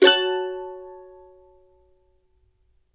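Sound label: CEE CHORDS F# minor, Blues Guitar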